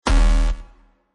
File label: answer wrong; yumpod